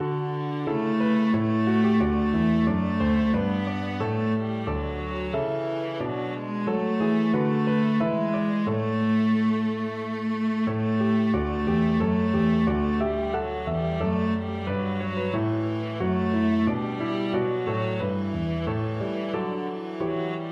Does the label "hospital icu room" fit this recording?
No